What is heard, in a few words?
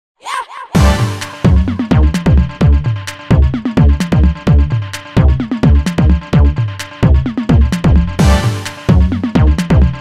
Getcha Dance On, no vocals; Soundzabound